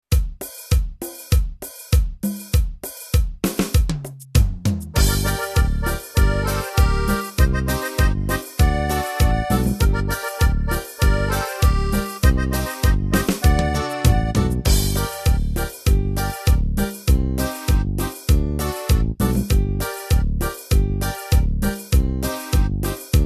ritmos yamaha cumbia

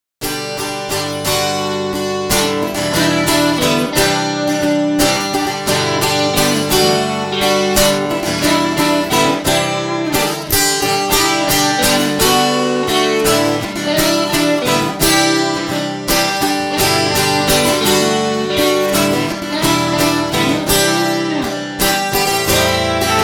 guitarbox acustic

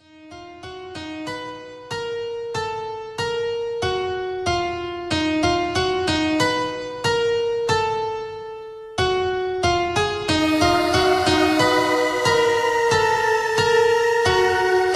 horror beat instrumental rap

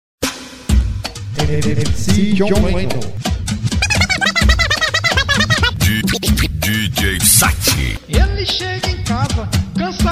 funk

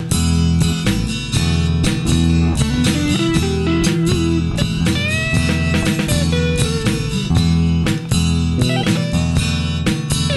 guitar blues

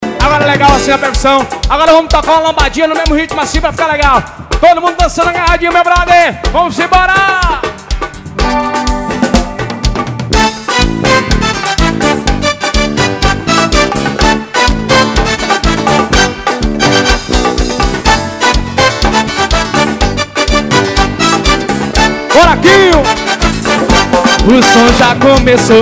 lamba dance

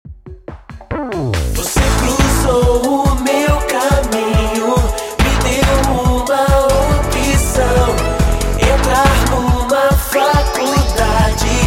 jingle; toledo

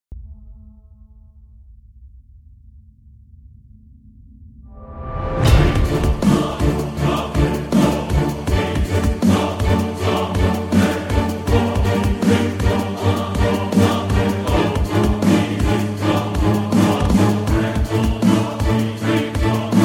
eletronic dub choral

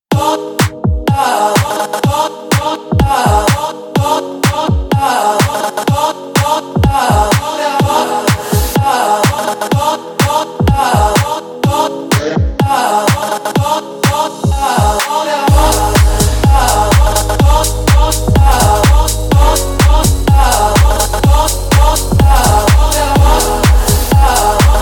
remix

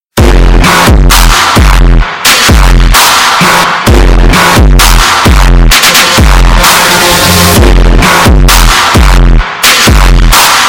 remix

